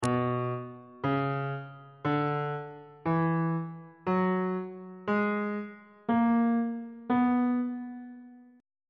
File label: c flat melodic minor scale classical descending